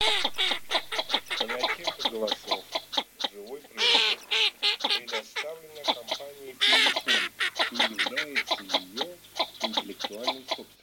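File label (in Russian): Широконоска Mestolone, манок; plurifon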